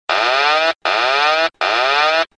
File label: Low Health, Helicopter Vehicle